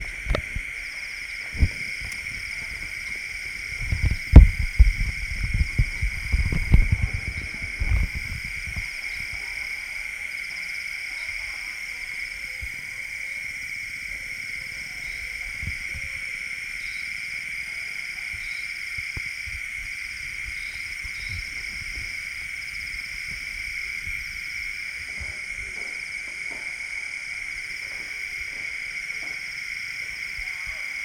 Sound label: night frogs, South India street recordings